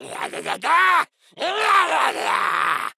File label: Combat